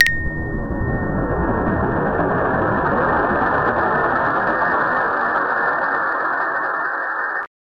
cosmic ping longdrive

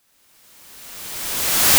backwards

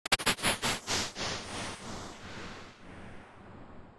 echo chamber

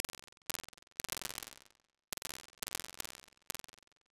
impulse rhythm

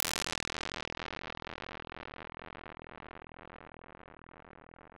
chorus feedback

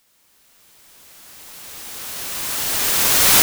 backwards

